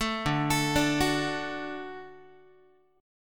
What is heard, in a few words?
D Major D down Guitar; 12,10,12,12,10,x